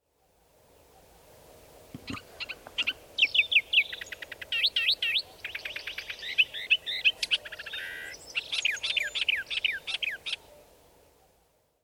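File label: Thick-billed Warbler, amur; koe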